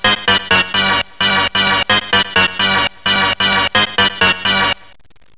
MUSIC

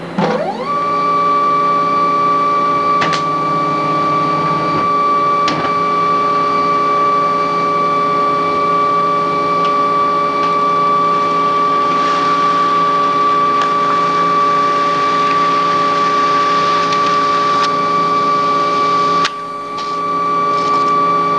laser

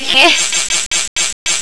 ROBOTIC, WEIRD

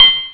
collect

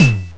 BOUNC

